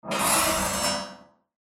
metal scraping eerie

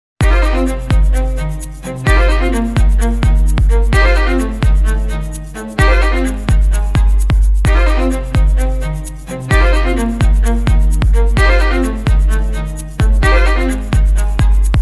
Bass Cruise; Soundzabound Volume 5

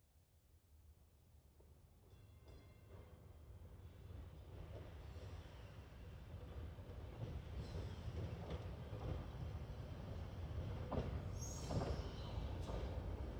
Trolley Approaching

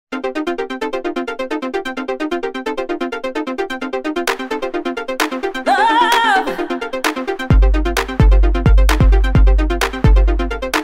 Love Peace; Soundzabound Volume 5

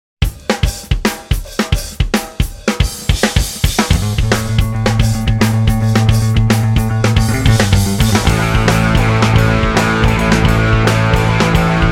Speed Metal; Soundzabound Volume 3

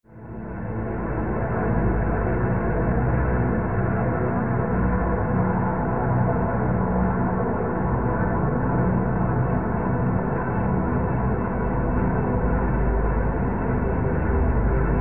Eerie Drone

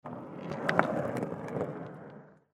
skateboard roll

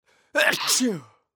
Sneeze

